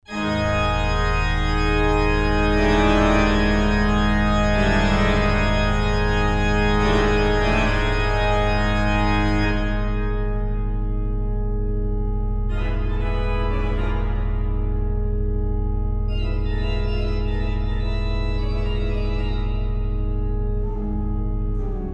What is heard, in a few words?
Pastoral Drone